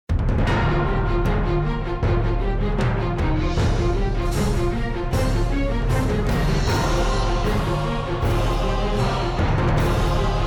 bgm

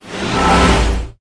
heal

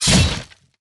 big sword

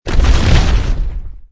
rock

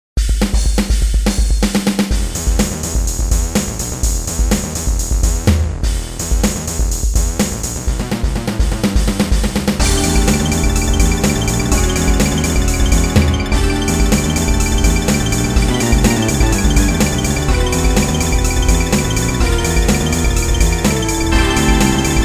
vampire battle

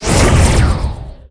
Wind

